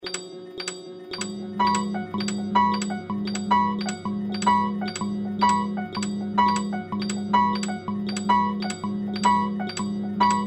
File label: piano; arnaud-romet; free-fruits; pianorepeto